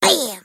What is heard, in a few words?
hit; freak fortress 2; hat kid